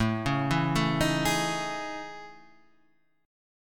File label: A Major Sharp 11th